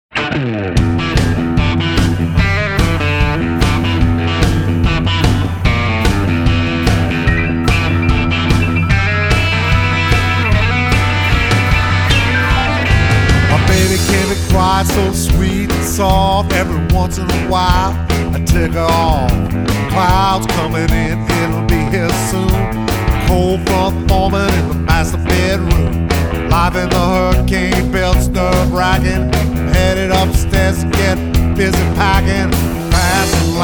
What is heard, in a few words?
Fast And Loud